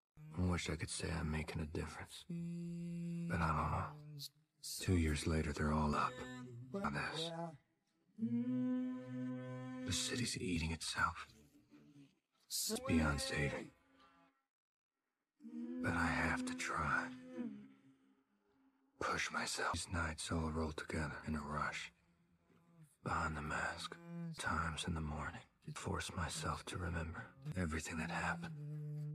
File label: vocals